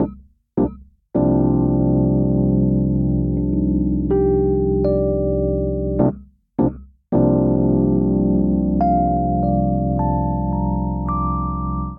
Vamp Mellow Jazz Funk C Minor 80 bpm, Electric Keyboards; C Minor 11; Ableton; Factory Packs